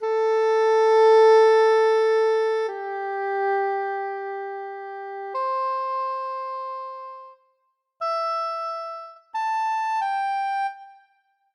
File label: AfterTch